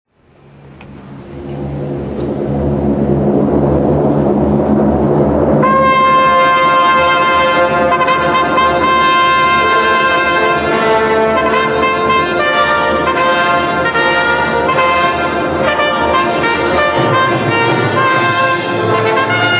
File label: clarinada; mahler; musicas para casamento